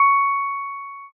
pon

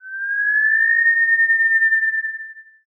kettle

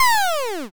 fall down

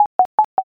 cute step

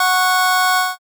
organ

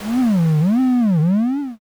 gate

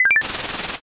fax

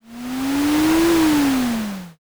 ship pass

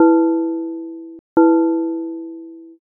gong clock

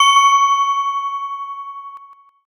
handbell

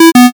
error

